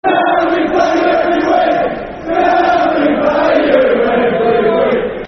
Can We Play You Every Week, Football Chant (Soccer Song); Watford Wfc